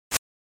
SNES RPG Miss

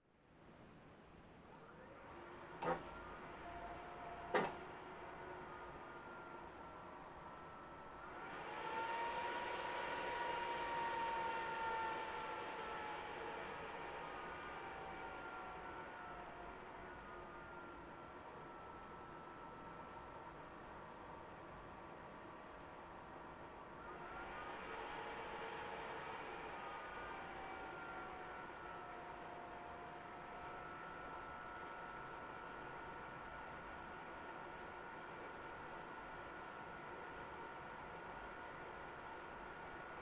PC Operating Noise Far On Off

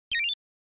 NES Fighting

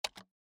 PC Keyboard Space

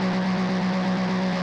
Racing Engine Loop; SNES

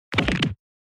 Motion Fracture

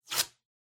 Masking Tape Peel Off

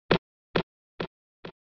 SNES RPG Stairs

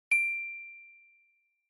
Music Box Single

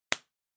Glue Stick Close

